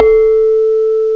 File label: CHIMES, MALLETS; M8; CMI Factory